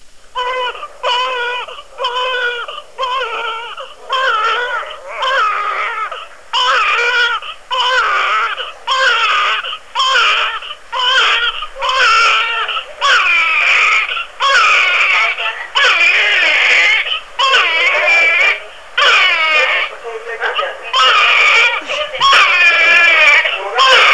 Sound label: longcry